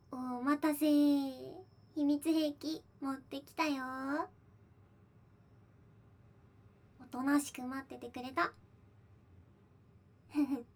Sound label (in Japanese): 全身オイルマッサージ, ASMR; 優しい夜